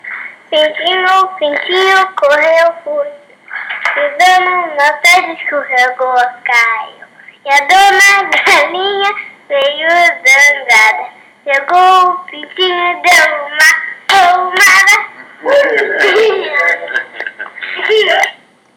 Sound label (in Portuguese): cantando